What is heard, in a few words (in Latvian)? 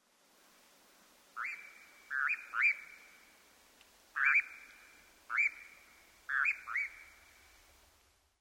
balss krruit; a.celmins